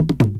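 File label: MUTUMBA, AFRICAN RHYTHM; Crypton - DrumBase5000; AFRICNRHYTHM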